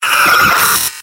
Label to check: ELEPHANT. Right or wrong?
Wrong